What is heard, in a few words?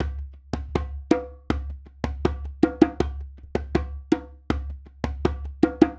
djembe loop 80